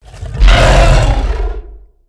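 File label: rancor attack; Star Wars Knights Of The Old Republic I; XBOX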